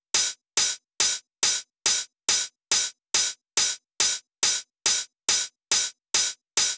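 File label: drum Loop 140BPM overhead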